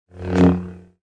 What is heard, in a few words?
saber; Dark Vader